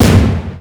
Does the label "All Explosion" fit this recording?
Yes